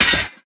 grab sword

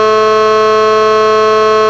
simple, horn; vcmod

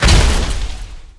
step heavy

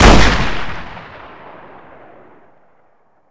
brush gun

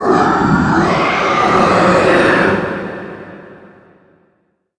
transform scream loud; gwyn

